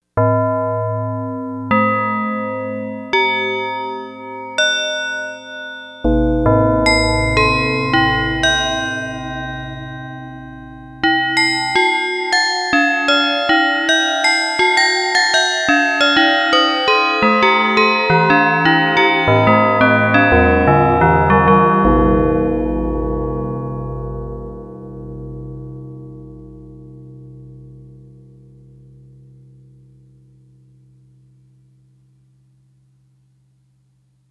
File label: Tube Bells, Yamaha DX100; Factory Patches